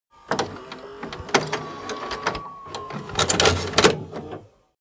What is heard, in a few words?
video player handling cassette